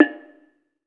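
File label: BONANG, Metalophones, Pitched Percussion; E-MU Producer Series Vol. 7 – Old World Instruments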